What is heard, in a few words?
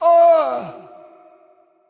Death; Creature; Bolvar Fordragon